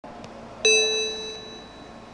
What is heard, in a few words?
chime